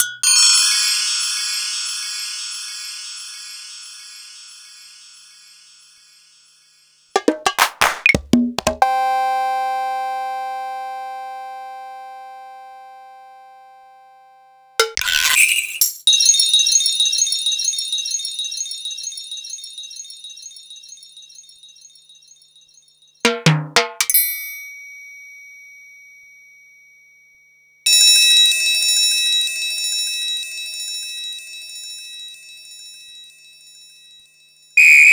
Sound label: Korg 05R-W Korg Percussion